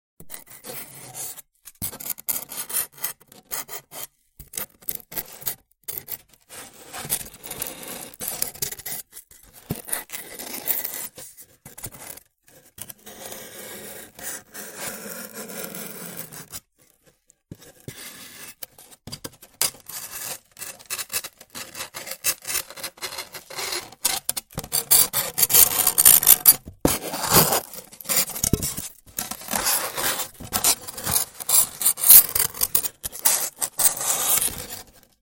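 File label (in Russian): абсолютно ужасный пенопластовый визг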